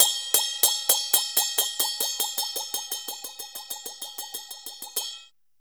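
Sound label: CHINA, China cymbal; Spectrasonics - Heart of Asia CD2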